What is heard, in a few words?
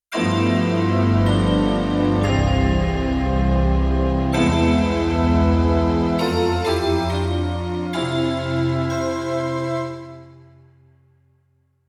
Synthesis; Europop Performance 1